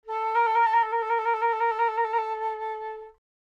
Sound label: Flute; 気鳴楽器; AirReed